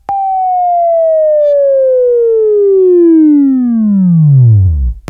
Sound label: WAV Left, Sweeping; Sweeping Training program